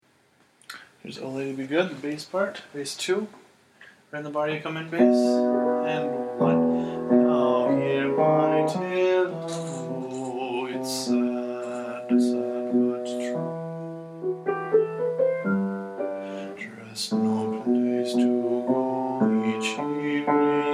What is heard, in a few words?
bass